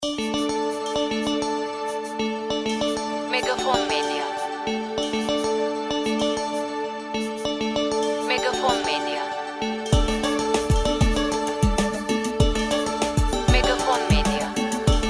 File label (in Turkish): SANTRAL MÜZİK